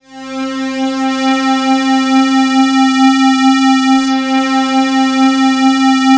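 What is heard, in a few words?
PAD C4 right, Jungle; USB Soundscan vol.05 - Explosive Jungle; AKAI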